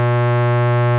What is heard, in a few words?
HUM, 机器